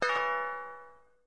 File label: Landing Head; smite; kumbhakarna